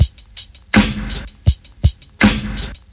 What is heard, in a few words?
Drum loops Boombap